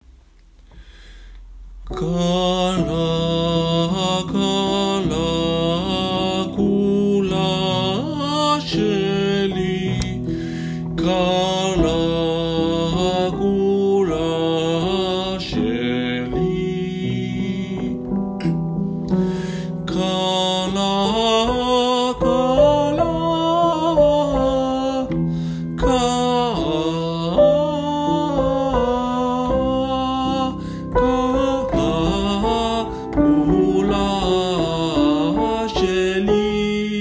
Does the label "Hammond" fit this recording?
No